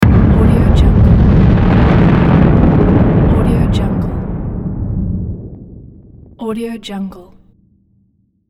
bomb

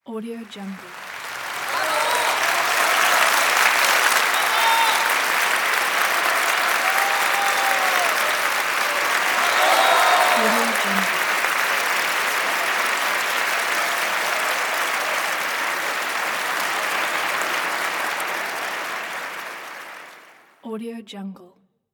applause

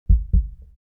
human body heart beat double; smartsoundfx

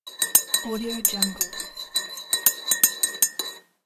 stirring coffee

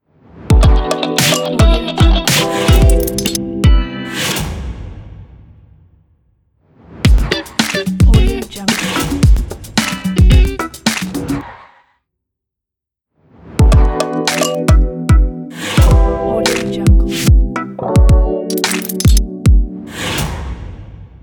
fashion logo

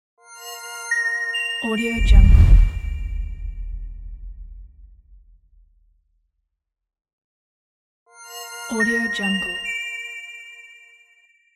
short logo

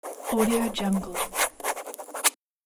pen

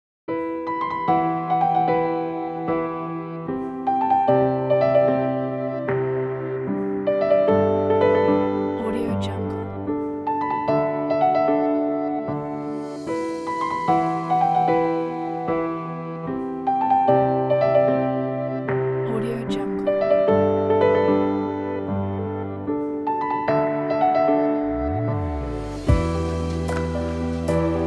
romantic film

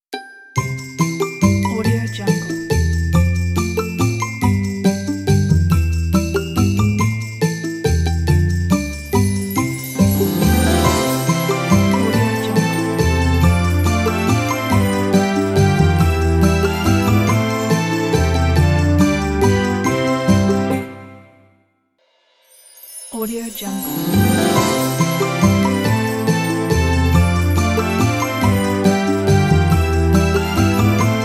christmas logo pack

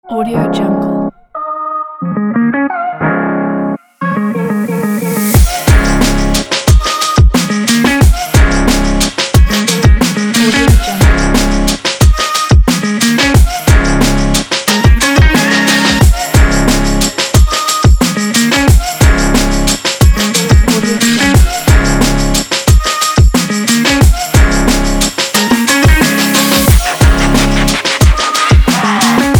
timelapse trip hop

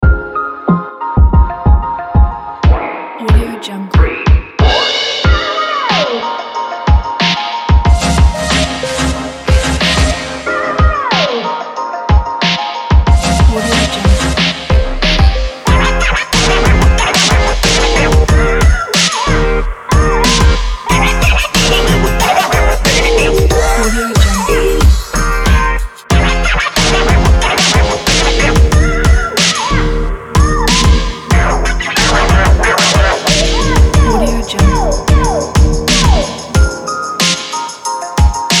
background fashion hiphop

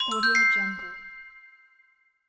correct answer bell

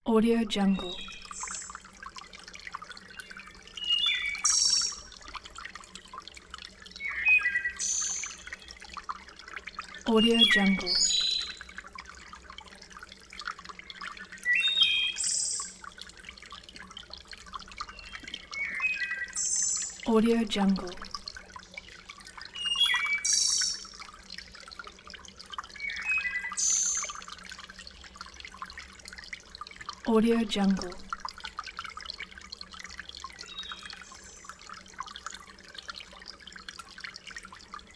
river thrush; shining-wolf

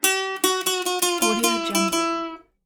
tuning down acoustic guitar string

